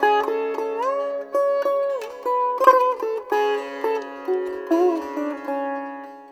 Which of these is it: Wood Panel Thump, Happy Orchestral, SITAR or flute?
SITAR